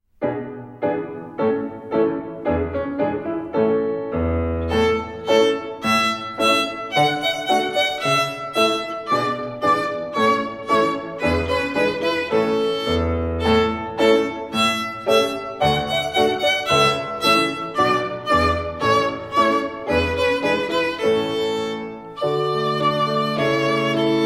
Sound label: Thunderstorm Performance Strings